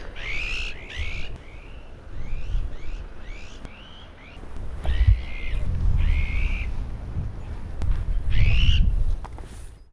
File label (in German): blauelster